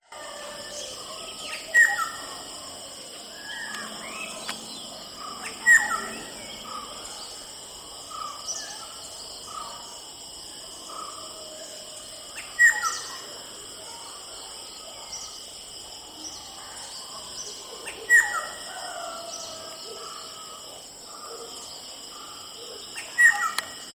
Black hooded Oriole Oriolus xanthornus, black hooded oriole call; Bird Call